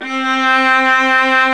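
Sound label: C4 VIBRATO VIOLIN; Northstar - New Gold VOL-2; ORCH.COMP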